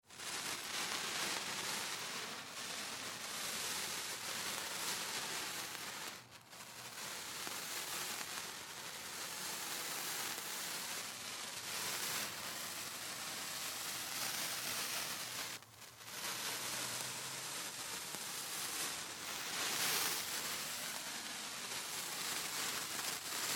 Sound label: Fire Sparkler Burns Sizzle bys Moves Med Far; Chinese New Year; Big Room Sound